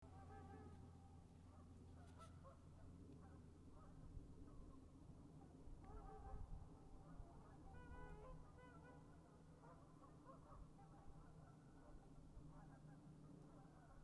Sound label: Symphony of Swans and Geese on Winter Solstice